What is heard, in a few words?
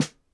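snare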